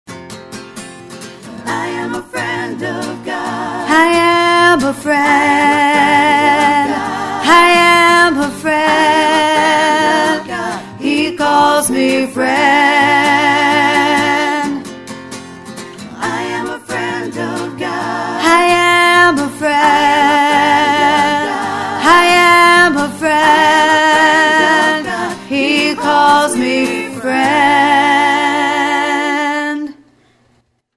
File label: tenor end